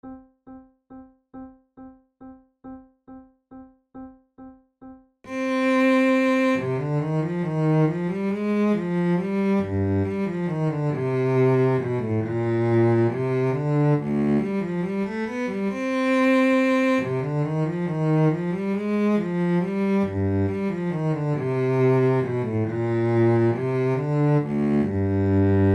chedeville amusemens de bellone no4 iii menuet, flute